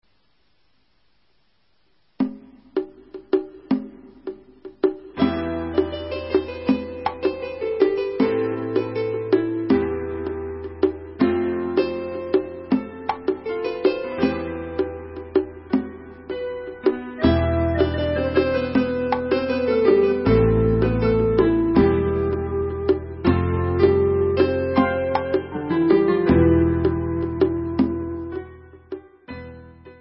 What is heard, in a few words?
Instrumental